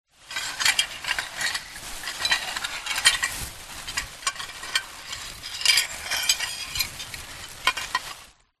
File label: household plastic bag containing broken plate pieces movement